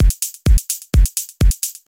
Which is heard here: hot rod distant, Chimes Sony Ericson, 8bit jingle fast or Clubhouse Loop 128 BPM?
Clubhouse Loop 128 BPM